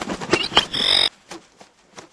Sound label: Giant Red Condor die; Seer; GamigoNA